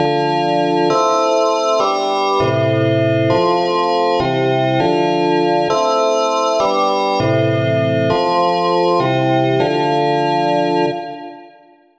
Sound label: Key BellKey